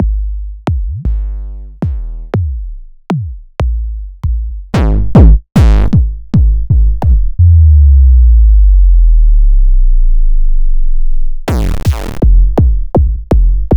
Drumbox; DeepSky

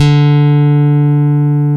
CHAP STICK, D 4; East Collexion - Bass S3000

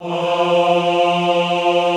AHH F2, Extended Classical Choir; Best Service

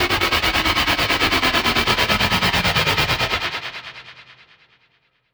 Fall, Hits & Falls; Zero-G Trance Inducer

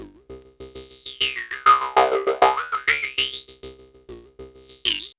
XPANDER; AMG - Vince Clarke Lucky Bastard

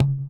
THROAT; Spectrasonics; Metamorphosis; THROATERS

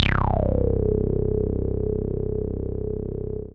Dance Floor Bass, Multisample Basses

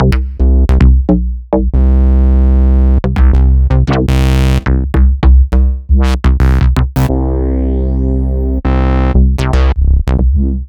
Basses; Electronisounds

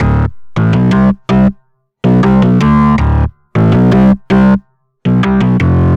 Bass, Synth Bass Loops; Basses; Sonic Foundry (Sony Creative Software); Groove Elements

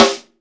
METAL, LUDWIG SNARE

